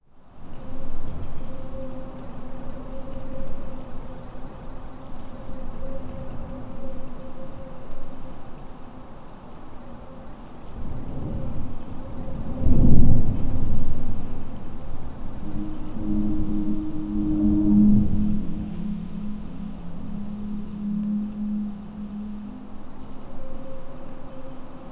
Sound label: haunted mansion low drone; creak